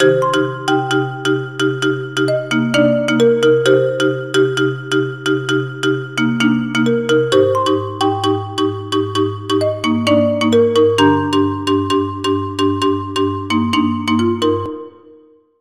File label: LG G7 Marimba